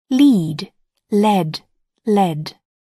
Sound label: lead